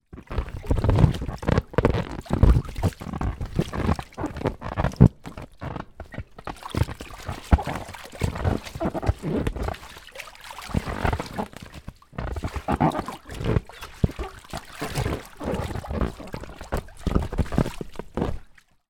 leisure inflatable rubber ring person on movements squeaks creaks water; zapsplat